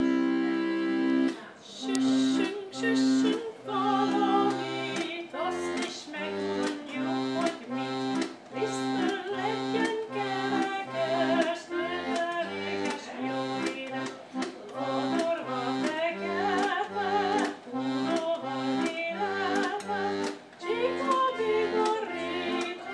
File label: Hungarian music in an umpah stylie on a pianorgan